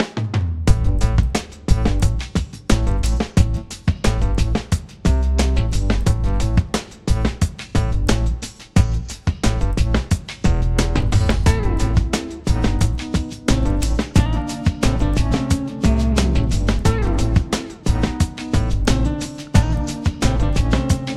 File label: Instructions Loop; The Jackbox Party Pack 5; Jackbox Games